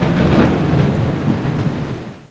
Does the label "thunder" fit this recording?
Yes